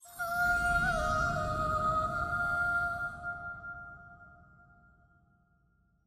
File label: mermaid Win